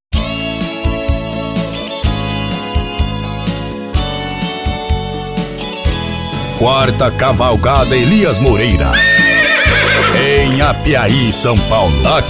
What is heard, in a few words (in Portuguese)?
sertanejo classico